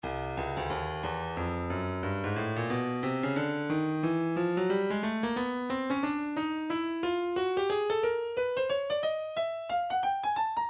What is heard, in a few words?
breakbeat 090 bpm; Stylus; Nu Skool